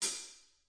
hat, DRUMS, REAL LIVE KITS; Osama Qureshi DrumKit

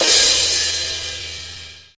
CYMBAL, Electronic Jazz Kit; STYLE KITS (Hip Hop, Etc)

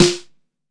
metal sd, Snares; single hits

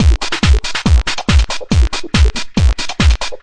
140 bpm squirt percusso techno

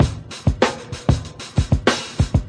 theory groove, drum loops and breaks, 096 bpm; 091 to 100 bpm

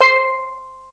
steel drum

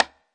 classic hh Conga 3, Classic Hip Hop; STYLE KITS (Hip Hop, Etc); Classic Hip Hop Mega Kit

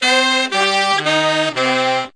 descending horns; HORNS SAX TRUMPET BRASS

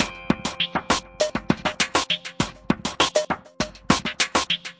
plastic looper, drum loops and breaks, 100 bpm; 091 to 100 bpm

